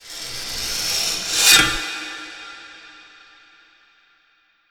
Blade Party

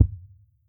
Sub Kick

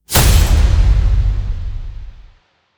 SHORT HIT; TRAILER TOOLKIT VOL.1; Eat the Bullet

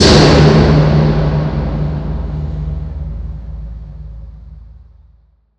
SLAM Shipping Container Long Metal Pipe RAW

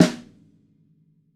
Snare Top

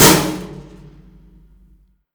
SLAM Trash Can Short Metal Pipe

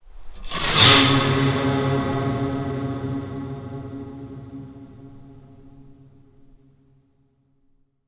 Creepy Harp

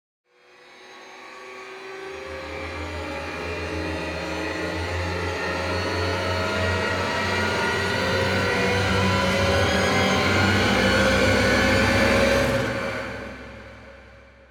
TRAILER TOOLKIT REVERSES High and Low

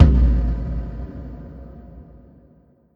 Tank Boom

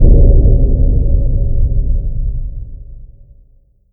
SLAM BOOM; Event Horizon

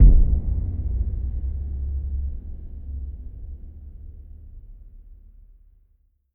Boom Abyss Bottom

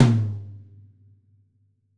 Rack Tom

